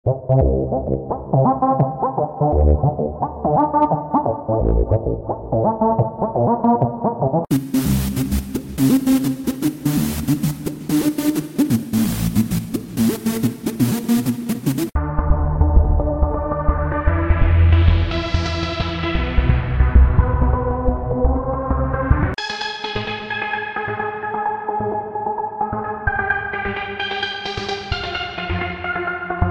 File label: Arp and Synth